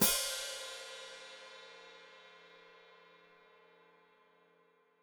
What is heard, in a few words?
Hat Closed Open, Hi-Hat